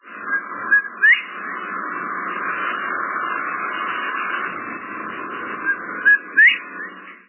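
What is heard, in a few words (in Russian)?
звук пятнистой американской перепелки в дикой природе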